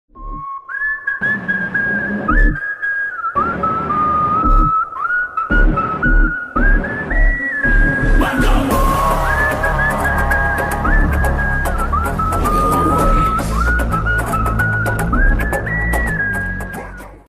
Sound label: blood epic bgm ringtone; marco